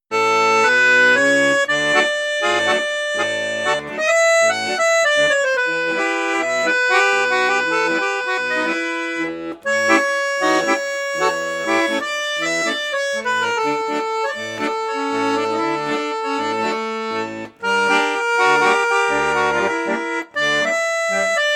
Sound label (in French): registre basson flute juste, accordéon; crucianelli